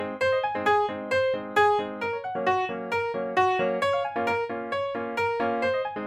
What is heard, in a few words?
Piano; multitracks